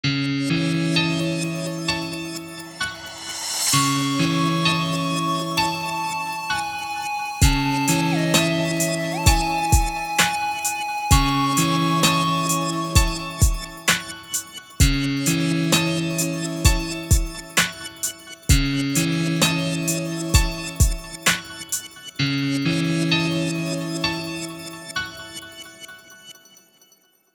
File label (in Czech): melodii beat; k vasemu zpevu